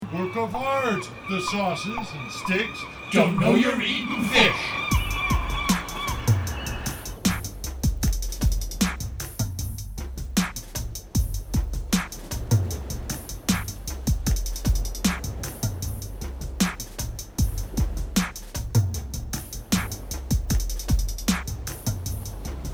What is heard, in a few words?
audition no voice; Audition 1 minus voice; Casey